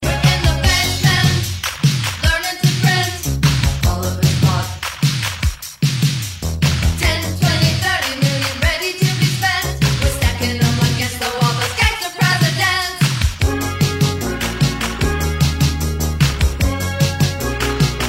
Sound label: Rock 80s